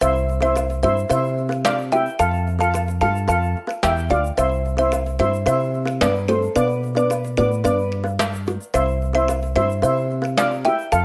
game music fun tropical caribean steel drums percussion; zapsplat